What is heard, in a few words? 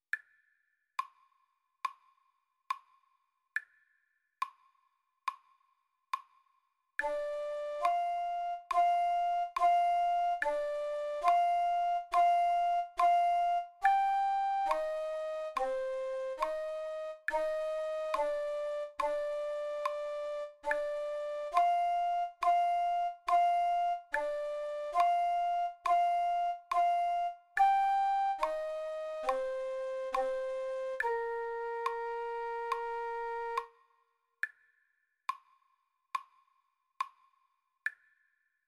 russian folk